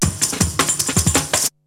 BREAKBEATS, TECHNO

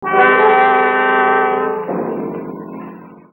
Ancient Music FX, Industrial

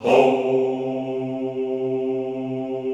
"houuu" C2, classical choir; Best Service; Extended Classical Choir

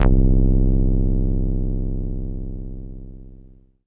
Funkster c1, Korg Poly-800

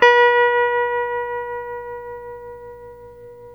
TELE TREM C, Guitars, TREMO; Spectrasonics; Hans Zimmer Guitars VOL-1; TREMO-LUXURY

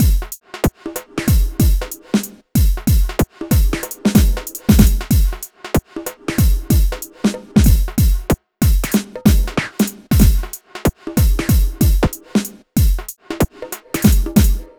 Shuffle Beat

